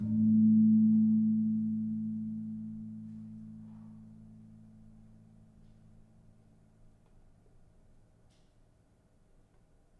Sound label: Kempul, gamelan